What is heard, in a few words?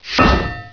anvil